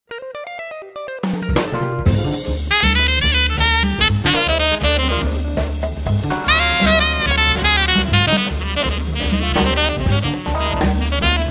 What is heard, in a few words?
Jazz Bar